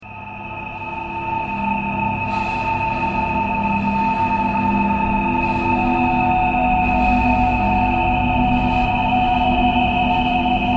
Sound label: traffic k core birds thread pentatonic; bowedmetal